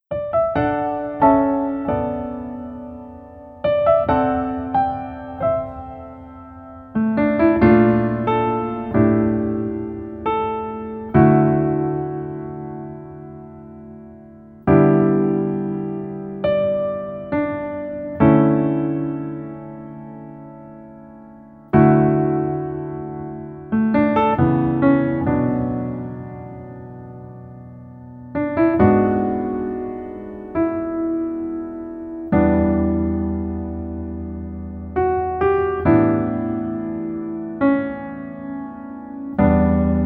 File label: Piano ver